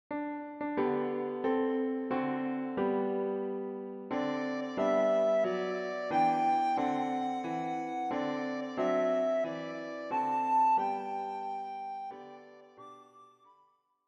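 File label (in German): Happy birthday to you, Sopranblockflöte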